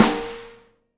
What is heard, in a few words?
SNARE RING, Live Snares; PlatinumPhattCD1; E-MU Producer Series Vol. 8 – Platinum Phatt